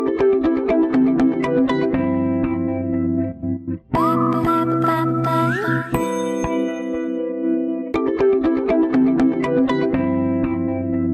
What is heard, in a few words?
sad music samsung galaxy ringtone